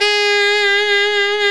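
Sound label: G#3 tenor sax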